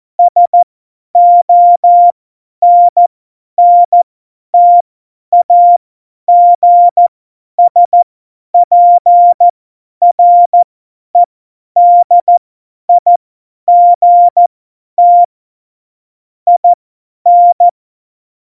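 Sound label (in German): Witz, cw morsen; Morsekurs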